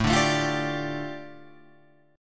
A 7th Suspended 4th Sharp 5th, A, down, Guitar, Open F; A7sus4#5 strummed